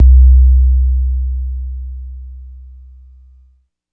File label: 808 BASS; East West - Phat & Phunky